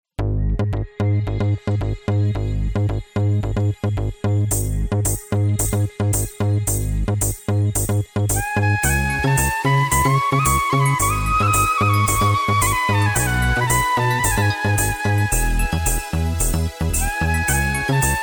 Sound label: Instrumental Song